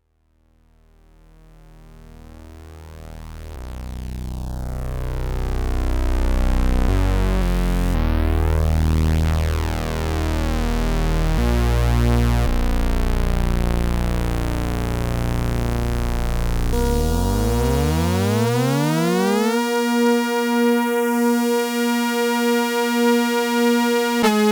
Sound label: 4 VCOs notchfilter